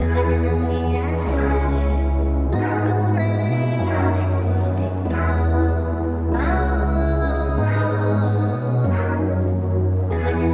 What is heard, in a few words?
95 BPM B Min, Melodies, Latin; Aventador